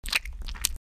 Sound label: Suck; Lewd SFX